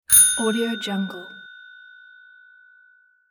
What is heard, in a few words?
bell